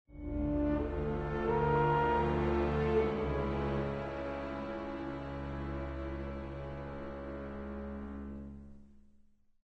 Music Achievement

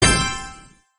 heal sound; Caravan Beast

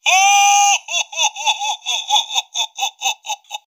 Showman Laugh

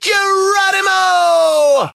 Balloon Forward parachute

